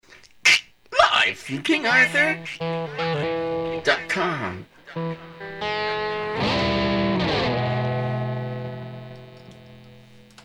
Soundcheck; Knew Zoo

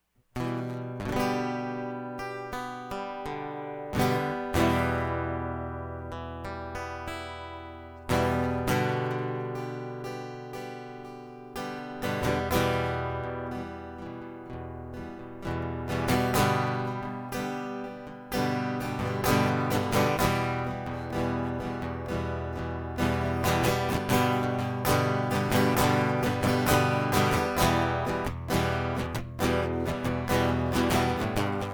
Guitar Prequel

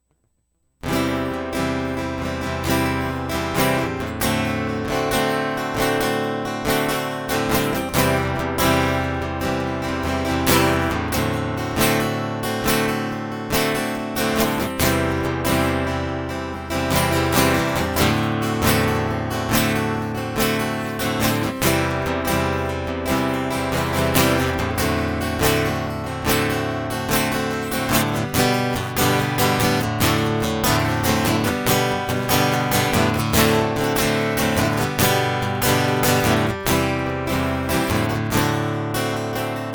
Flowering Guitar

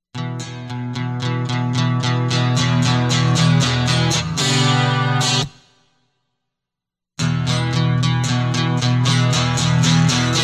Guitar Loop

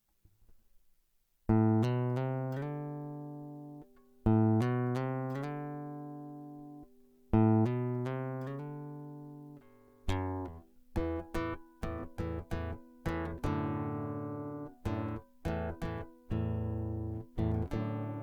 Guitar prequel

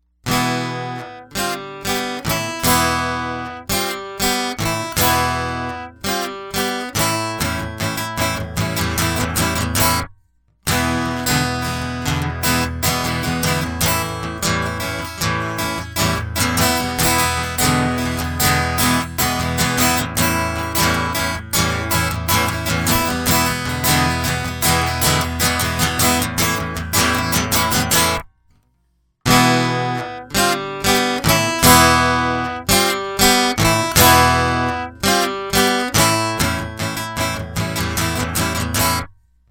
Guitar Loop; Childish

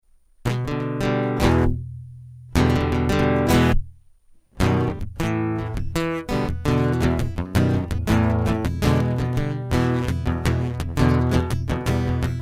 Guitar Loop

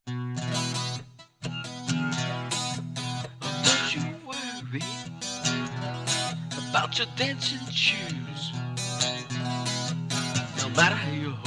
Boogie Woogie Blues; Animal Nature

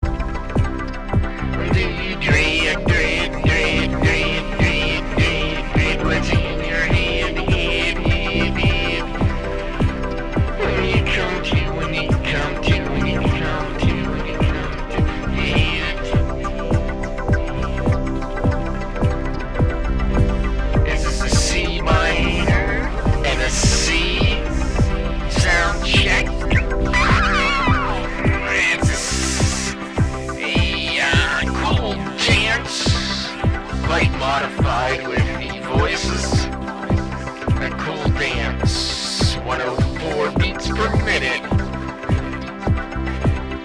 Soundcheck

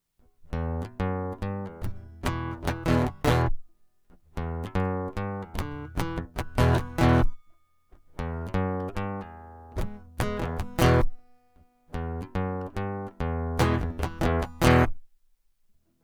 Get High Guitar Loop

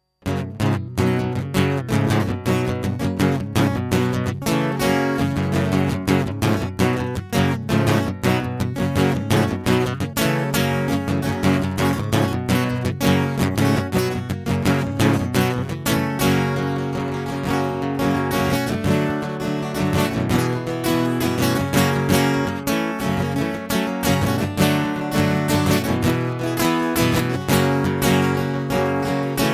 Go Go Get em Guitar Loop

